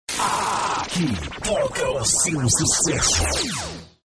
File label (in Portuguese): vinheta